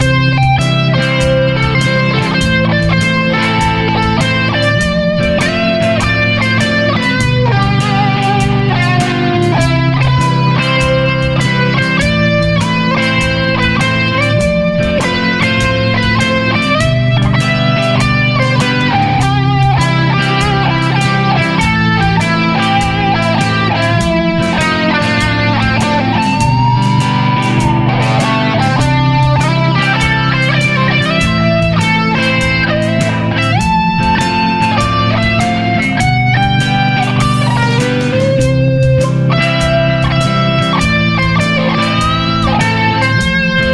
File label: entire scale